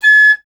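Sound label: flute piccolo G#; Miroslav Vitous - Solo Instruments VOL-2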